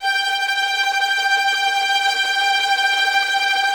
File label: VI TRM FG4; AKAI Advance Orchestra Upgrade 97 Vol.1; VI TREM F